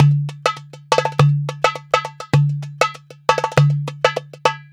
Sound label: MEH1 PERC, Balkanish Traditions